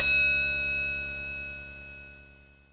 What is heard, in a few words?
elevator ding, doors; gesource